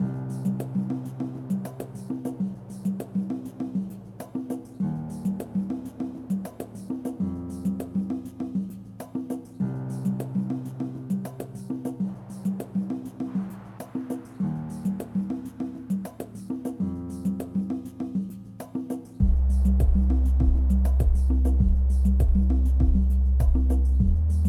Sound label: Desert